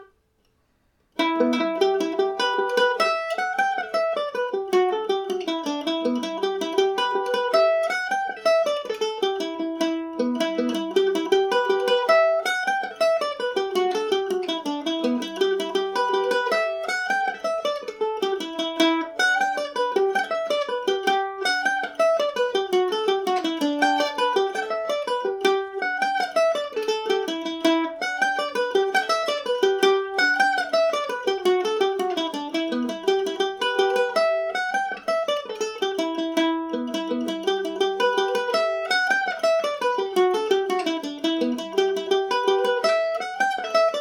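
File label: jig, E dorian, Irish mandolin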